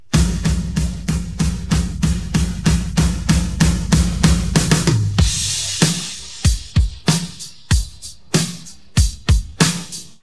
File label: drum